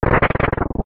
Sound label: door